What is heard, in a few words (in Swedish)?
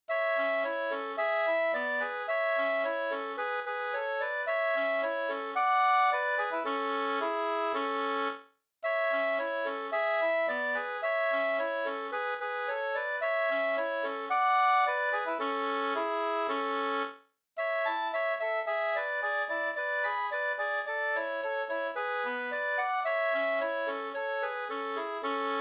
anglas, 2st